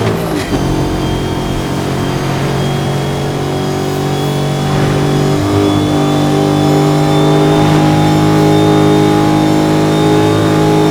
fourth cruise, vehicles

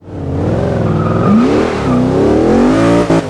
first, vehicles; gmod; tdmcars; mer slr

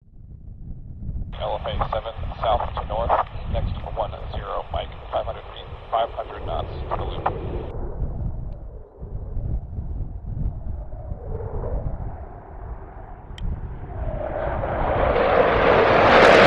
Jet Sounds and Radio Chatter; tccc; Training Aids; FINAL EX SOUND FX

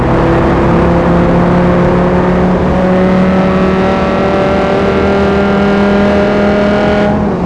third, vehicles; gmod; tdmcars; pt cruiser

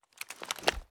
ar akilo47 reload fast mag out end; weapons; viper

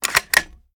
reload empty fast bolt close; reloads; gmod

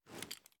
inspect; gmod

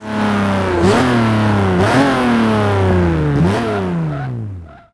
throttle off, vehicles; gmod; tdmcars; gullwing

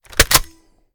reload empty waffle chamber; reloads; gmod; iw8

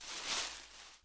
leaf; gmod

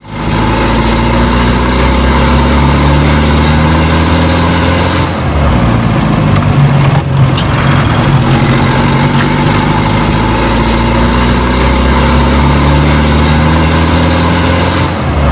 no shift, vehicles; defender

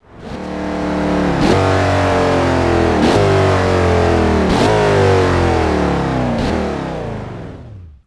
throttle off, vehicles; gmod; tdmcars; jag ftype

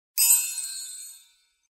collectable pickup; gmod

